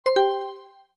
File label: Un Pause